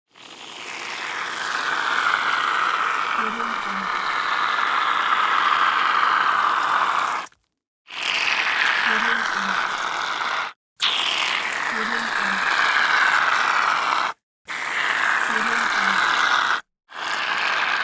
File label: whipped cream